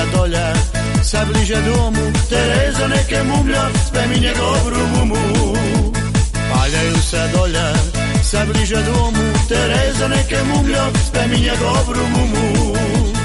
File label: VIOLIN POLKA MIX